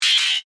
Laser Wipe Fast; Production, Element, Laser, Warble; Lasers (Sound Effects:Science Fiction); BlastWave

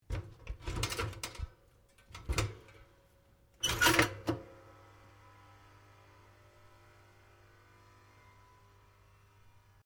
TOASTER OLD LOAD; Various kitchen appliances; Household; SoundEffects-Foundation4

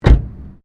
CAR TOYOTA COROLLA DOOR CLOSE EXTERIOR, hatchback; Toyota Corolla 20 09; Cars; Vehicles

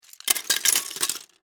Legos Drop Handful, Into, Box, Plastic, Toys; Foley; Drop Sound Effects; BlastWave

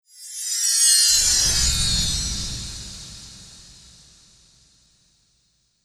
Fantasy Spell, magic sound effect

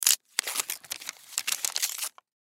Food Spam Can Tear Label Off; Food (Sound Effects:Household); Foley; Blastwave

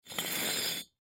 Brick Slide Move, Brick Slide Scrape Cement; Foley

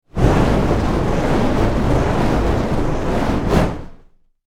Fire Fireball Wave Steady; Flames; Objects

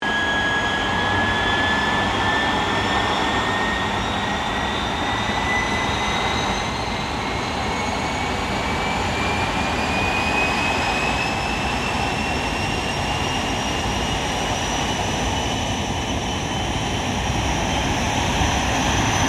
AIRCRAFT GLOSTER METEOR F MK8 TAXI; Gloster Meteor figter jet; Airplanes; Vehicles; Aircraft Collection; Twistedtracks

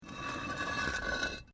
Brick Medium Hollow Move

